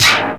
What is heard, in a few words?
PERC FX